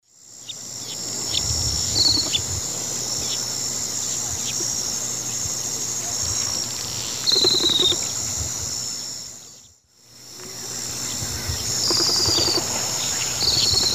Hydropsalis climacocerca, display calls; S. America; Eastern Lowlands

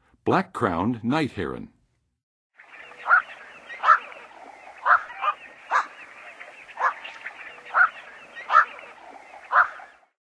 Black-crowned Night-heron, Birds of North America; Western USA; Western USA Songbirds

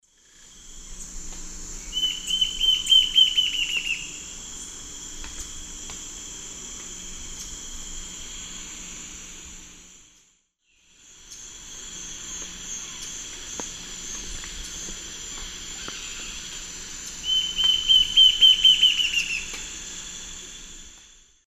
Lepidocolaptes albolineatus, song; S. America; Eastern Lowlands

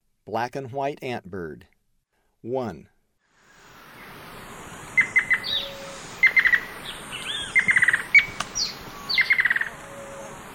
Black-and-white Antbird; S. America; Eastern Lowlands

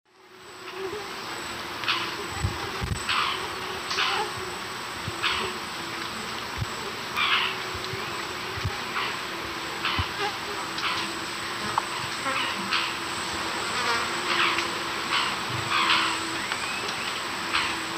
Pithys castaneus, calls; S. America; Eastern Lowlands

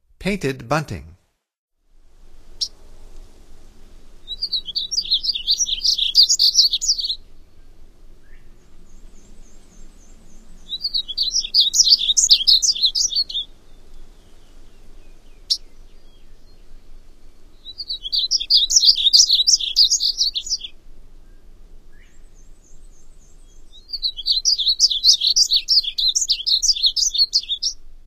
Painted Bunting, Songs of Birds of North America; Stokes

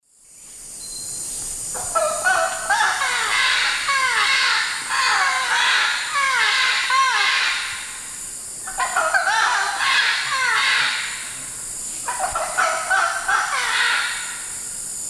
Ibycter americanus, calls group; Eastern Lowlands

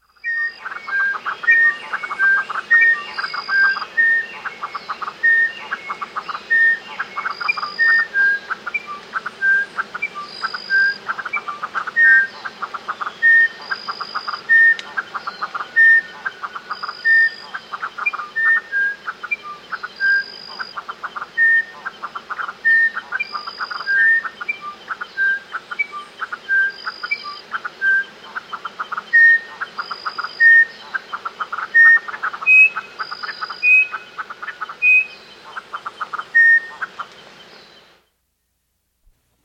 Song Wren; S. America

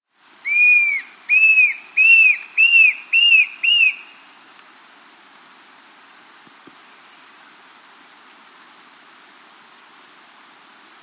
Tufted Antshrike; S. America

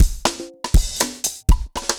dancefloor perc 120 bpm

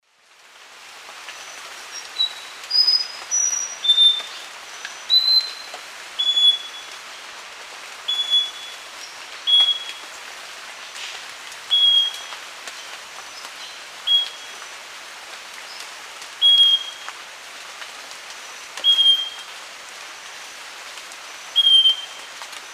Microcerculus marginatus, song; S. America; Eastern Lowlands

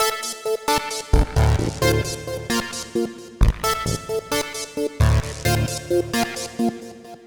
Lead Synth; Dance; Synths Lead

